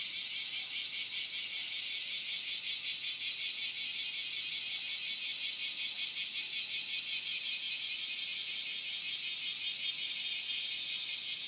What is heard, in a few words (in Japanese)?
クマゼミ